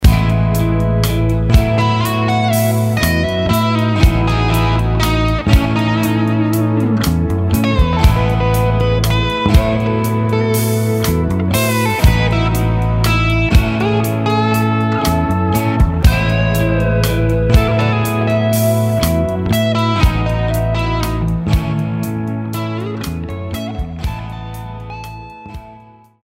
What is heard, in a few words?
A Lydian Parallel